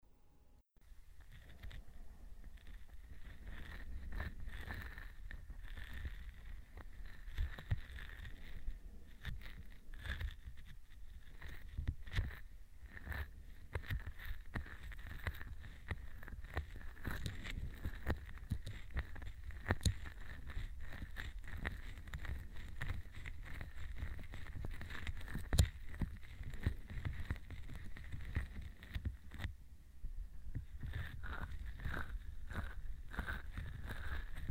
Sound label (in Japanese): 耳 の マッサージ(両耳)